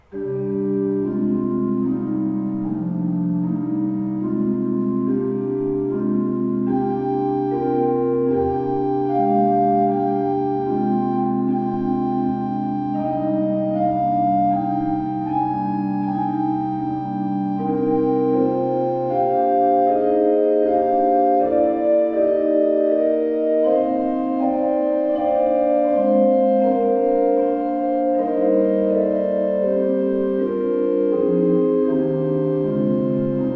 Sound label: pipe organ; Schuke Potsdam 25 II P